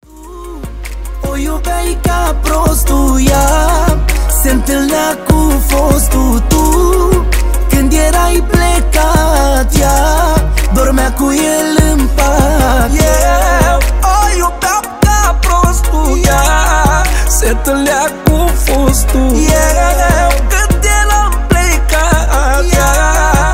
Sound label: Sax